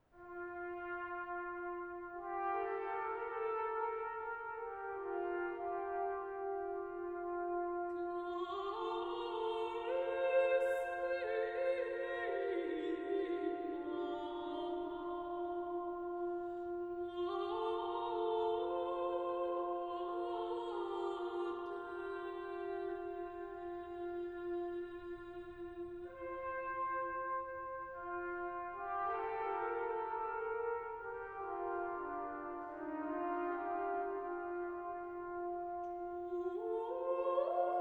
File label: Brass Ensembles